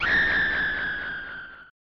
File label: scream; SNES